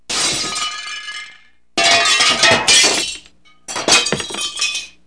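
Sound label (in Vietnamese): Âm thanh đập chén bát đĩa vỡ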